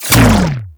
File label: Bomb Puzzle Switch Off; Missions; Raid; Grn Bomb Objective